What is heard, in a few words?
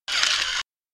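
Mechanism Fast On off, servos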